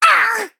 Male Imp Death

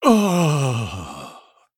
Death

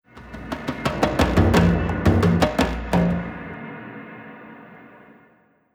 Play Stinger; Moorabi

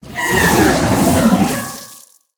Arcanosaur Death

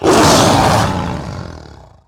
Night Prowler Play

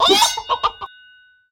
Chicken Attack

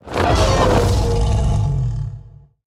Death Sound

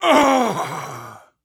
Temple Enforcer Death

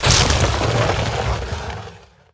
Quartz Elemental Death

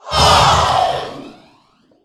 Zoobot Death

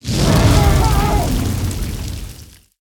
greasefire elemental death

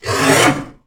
Raptor Attack; Stranglethorn

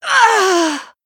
Human Emote Death

